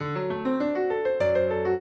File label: trio, piano; Mozart